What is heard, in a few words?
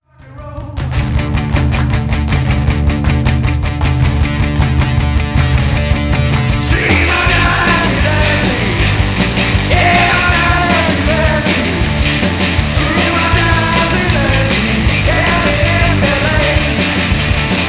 Wrecked Chords